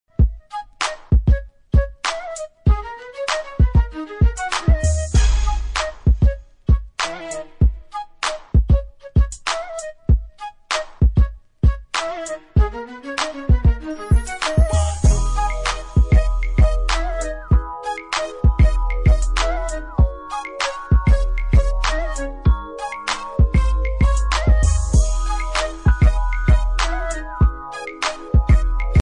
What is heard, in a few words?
beat music ringtone